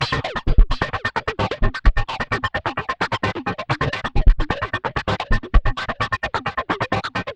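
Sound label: arpeggio; ILIO - Ethno Techno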